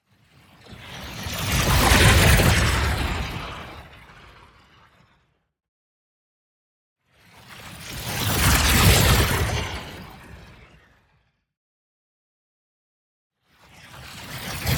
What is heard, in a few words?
WHOOSH LARGE Reflective Orbs; boom library; Matter